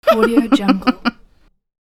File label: girl giggle